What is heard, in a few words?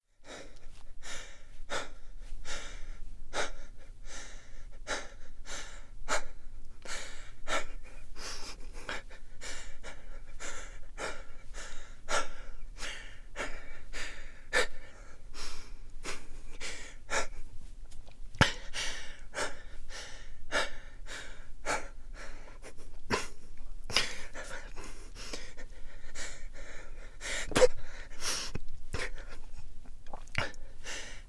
Less frantic breathing; the 39 steps; Foley